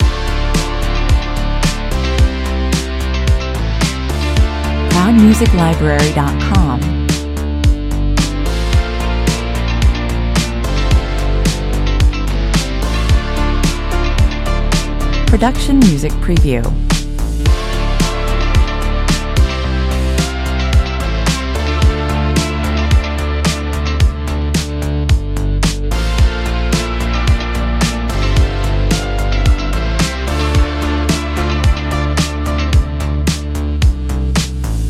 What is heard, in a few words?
loop